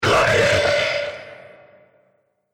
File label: Scream Huge Monster, Monster Sound Effects